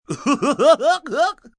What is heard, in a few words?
Laugh Goofy, Laugh, male, short, goofy, comical (human voice); Laughter